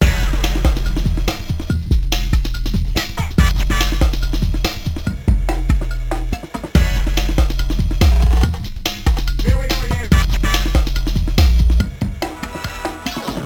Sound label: Music Continue; Warframe; Caliber Chicks Minigame